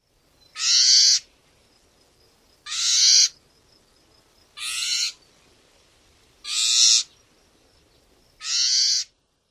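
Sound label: warbling vireo eeah calls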